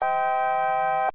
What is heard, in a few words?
warn alt enter